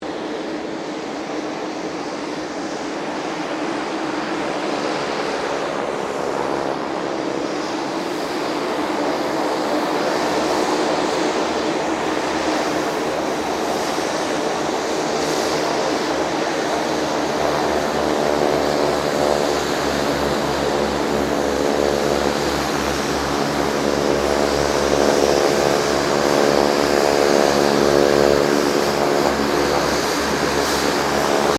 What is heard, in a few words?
2007 KING AIR 350 TAXI; Airplanes (Sound Effects:Vehicles)